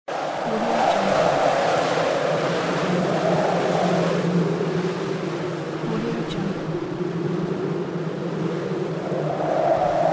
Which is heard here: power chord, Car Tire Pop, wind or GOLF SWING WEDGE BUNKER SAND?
wind